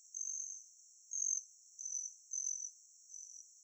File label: Hummingbird; birds